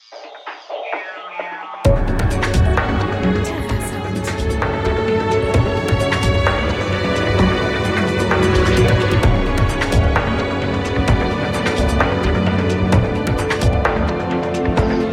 dramatic mission trailer; terrasound

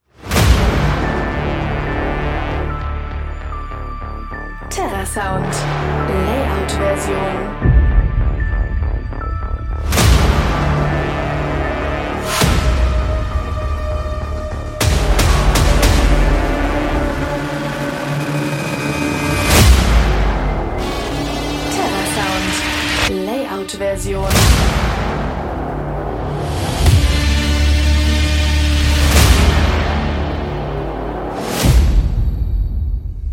action trailer; terrasound

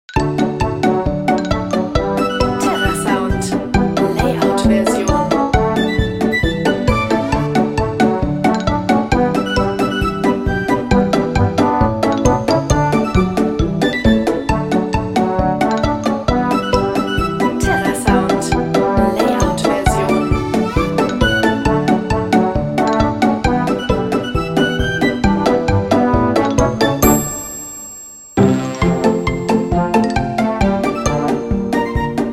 funny children; terrasound

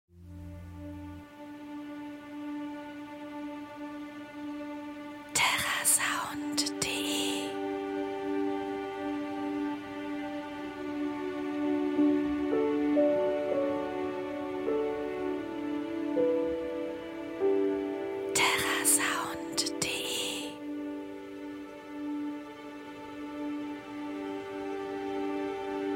emotional film theme; eternity; terrasound

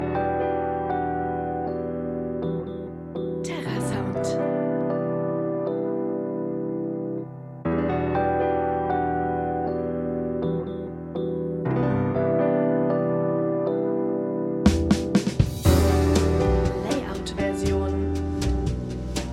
smooth jazz; terrasound

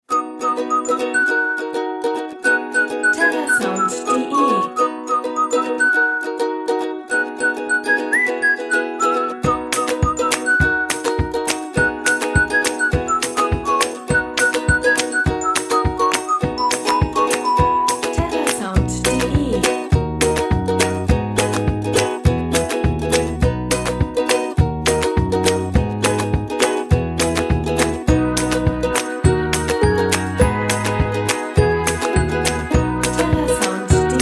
acoustic breeze; terrasound

